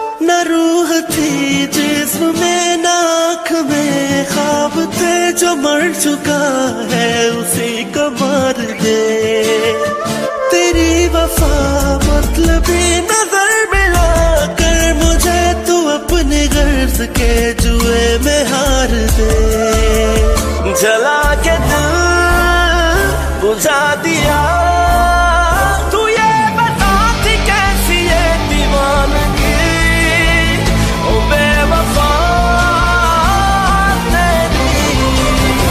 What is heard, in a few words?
Sad Song Ringtone